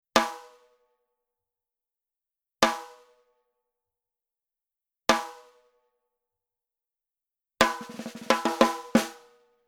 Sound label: SNARE Rim Shot